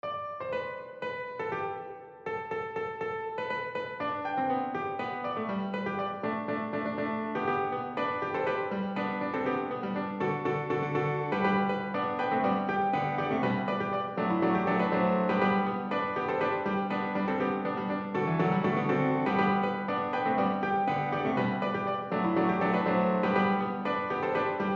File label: piano; W.A.Mozart; 'Grechtelt's enk' (Canon); KV.556; piano transcription